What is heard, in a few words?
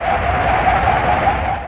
Avian